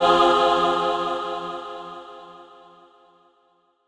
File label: FX Choir; Riddim; One-Shots; SFX; Ghosthack; Riddim Essentials Volume 2